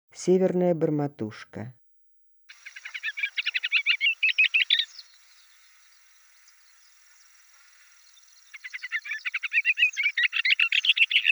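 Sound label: severnaya bormotushka golos, booted warbler voice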